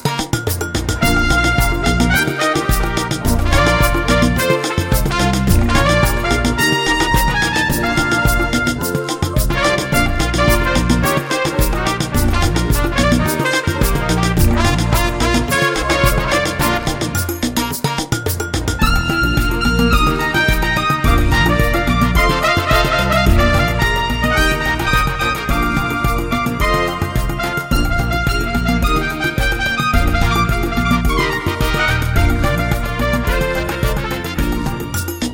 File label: Samba